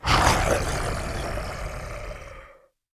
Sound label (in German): Monster